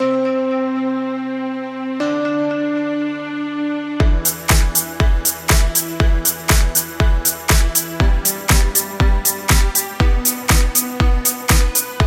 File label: Techno Rhythm